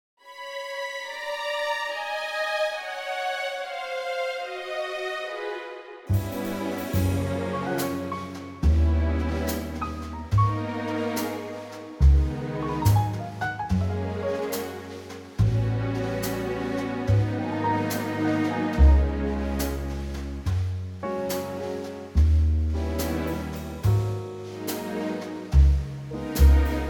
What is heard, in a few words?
Gb orchestra